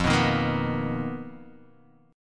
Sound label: B Major 7th Flat 5th F, chord shape